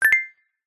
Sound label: multimedia notification bright metal tuned mallet short subtle; zapsplat